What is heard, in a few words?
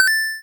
coin; Sling Birds